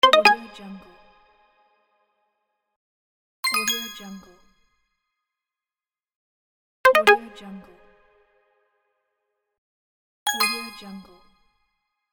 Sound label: soft notification